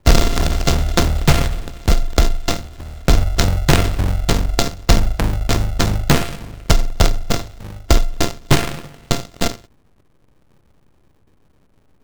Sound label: Test Beat stereo; 44 16 stereo ima4; libnyquist